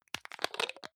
hygiene small screw cup open medium

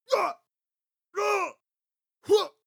male attack shout short; schreie; menschen

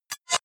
hover fast hard; maschinen; signale; soundpool

